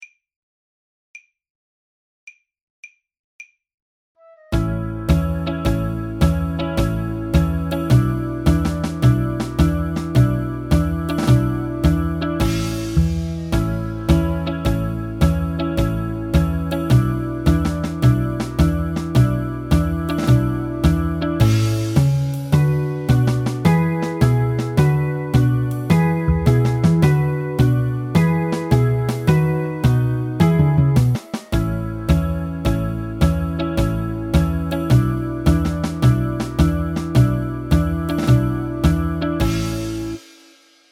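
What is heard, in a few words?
mexican hat dance karaoke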